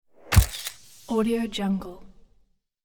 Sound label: old camera picture stinger